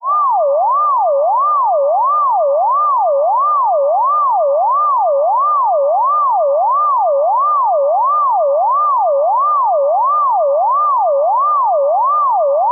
Fast Ambulance Siren

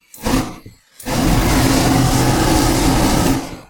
Flare

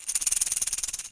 Gourd Rattle Long Shake

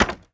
Wood Impact Single Plank

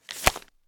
Text Notification

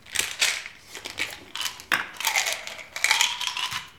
Taking A Pill

